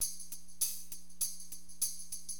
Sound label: Drums, Acid Jazz Funk; LiveAct Pool